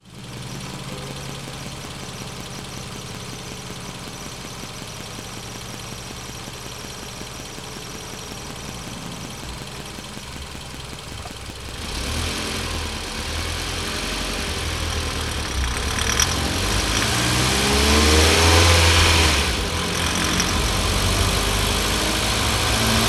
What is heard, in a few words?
strange metallic ticking noise under load, mitsubishi lancer; mitsubishi lancer sportback